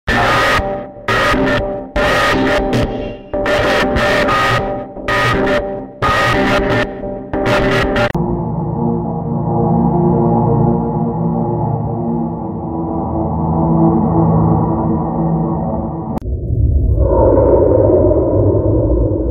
suoni horror suoni paurosi bhoot sound scary sound effetti sonori horror